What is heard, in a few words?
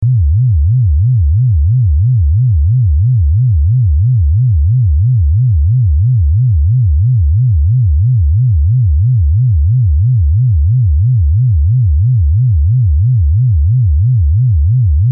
phase adjustment